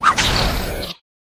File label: Wild Flower Dodge